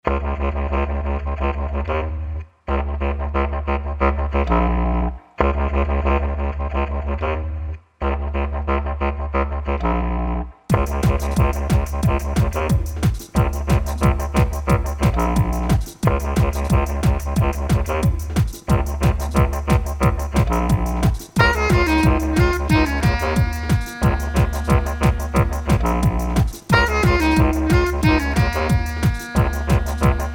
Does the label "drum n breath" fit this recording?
Yes